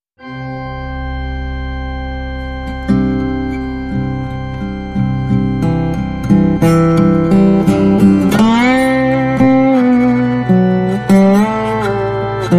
slide guitar with organ

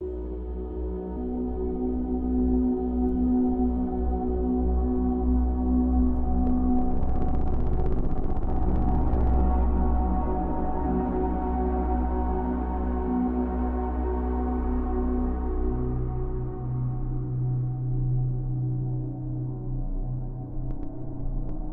Drone, Light

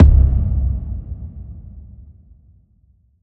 One Shot Stomp Out

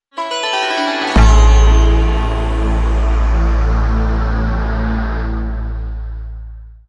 Hit

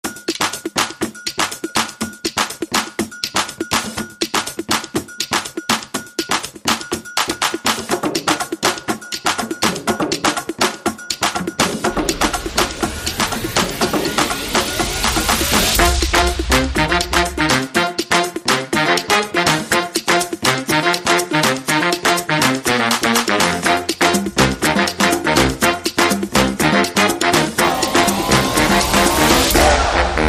Light Percussion

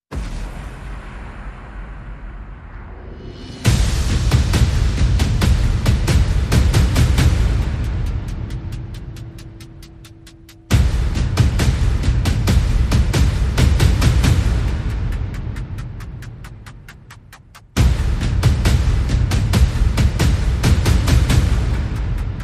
Percussion Only

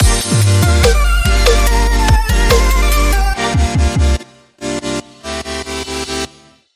Link Sting

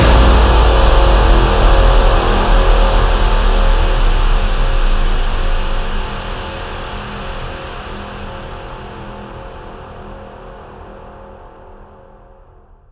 One Shot Big Hit

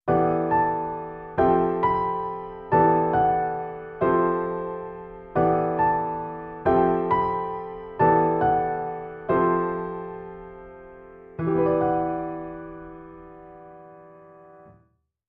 Jazzy Waltz Piano Only; 15sec

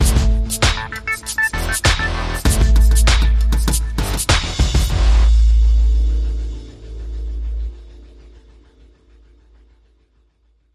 Sonic Ambi Beat

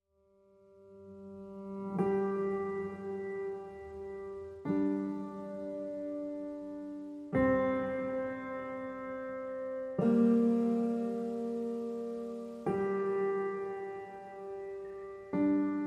Pianos Only

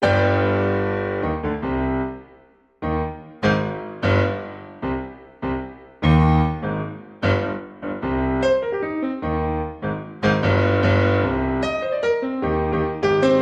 Rushing Refinement, Piano Only

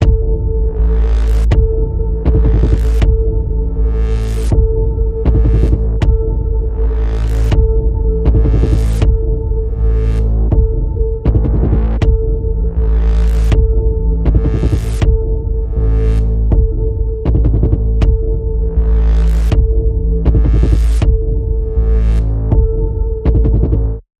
Ominous Chase Panning Pulse A, Key of A

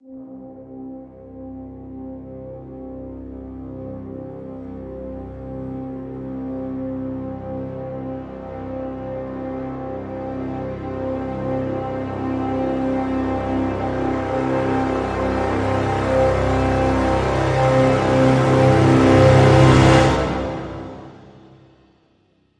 Brass Swell Full (C)

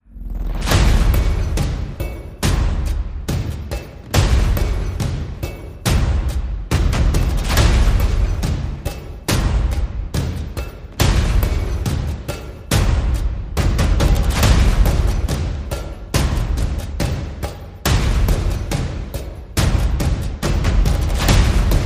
Percussion and Effects

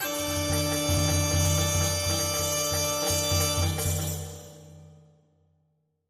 Ancient Greek Dance

